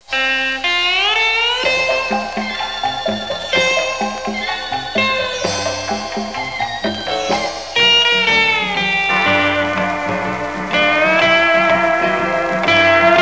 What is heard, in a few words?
suonerie musica traditional usa country; american